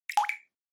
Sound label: Water Drop Low Dry